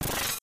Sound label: leaf; Super Smash Land